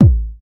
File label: puffy steel kick drum sound a key